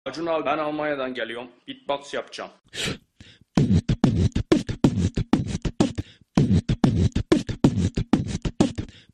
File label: beatbox; acun abi almanyadan geliyom beatbox yapcam original version